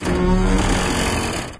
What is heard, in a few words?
squeeker, materials; Garry's Mod; hl2